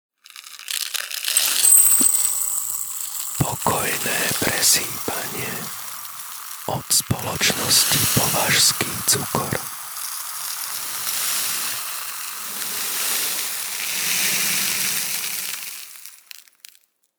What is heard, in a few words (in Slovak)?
pokojne presypanie povazsky cukor